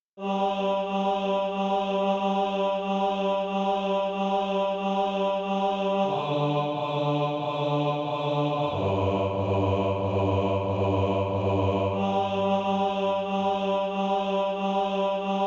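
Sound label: bass, Sheet music (SATB) including Practise Music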